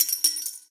coinfall

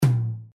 tom, drums; standard

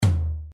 floor tom, drums; standard

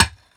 pickaxe

